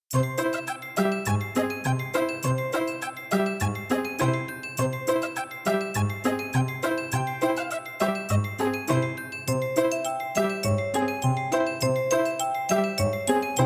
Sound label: MT-32; DeathGate